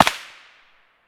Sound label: soil distant; Escape from Tarkov